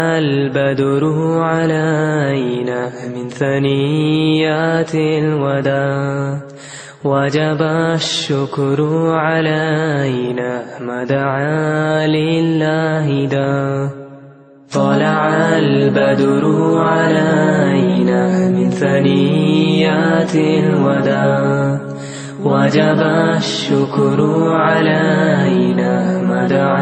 talaal badro alaina islamic ringtone

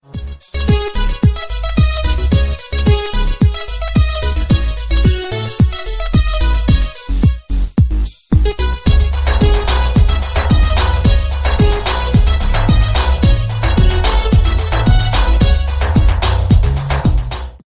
ringtone

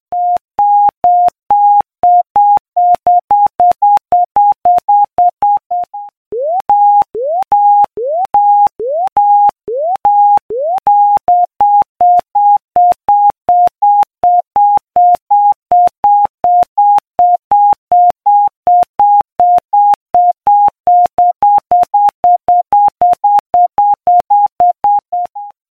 apple alarm beep ringtone

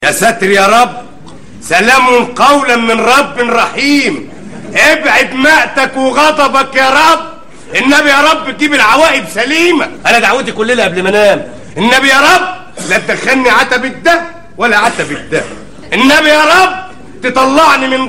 comedy arabic ringtone

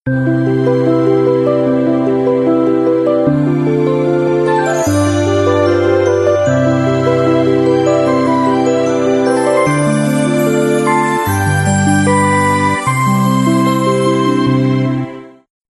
apple iphone xs plus ringtone

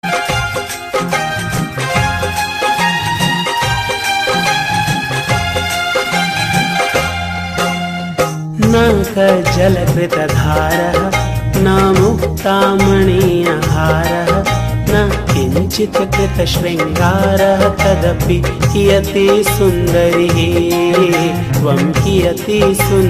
sanskrit romantic ringtone

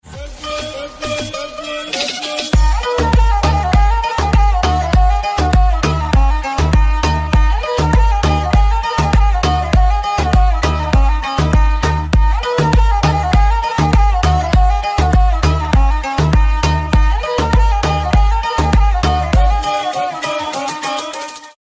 cool arabic new ringtone

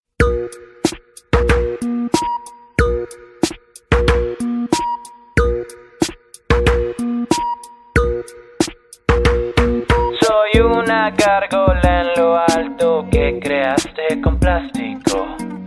castellano ringtone

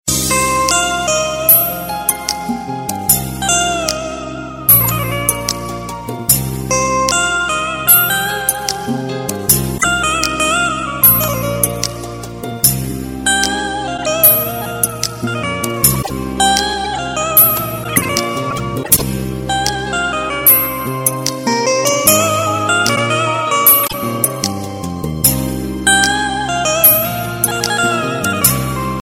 arabic instrumental ringtone

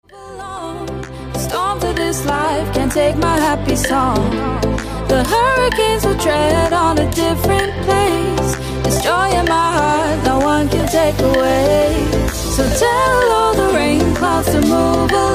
remix ringtone

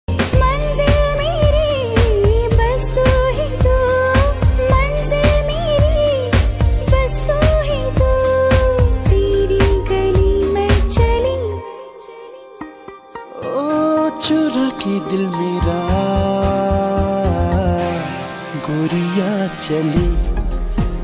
sweet love ringtone

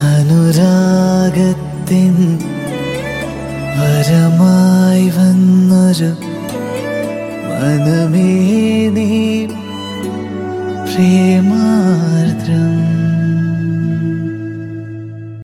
malayalam sad ringtone

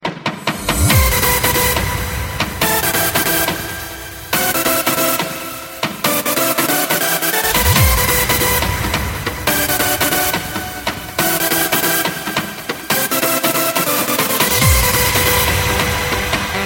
loud electro ringtone

